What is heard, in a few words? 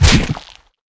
hive wound